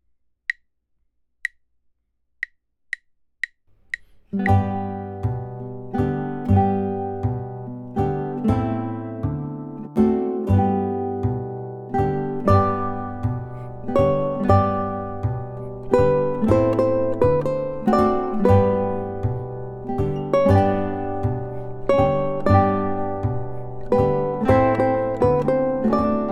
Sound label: Trio uku, ukulele; Beginning to Play Ukulele